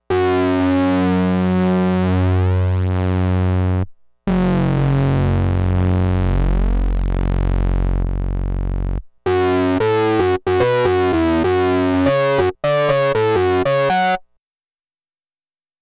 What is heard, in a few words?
fs1r sync attempts; fs1r demo clips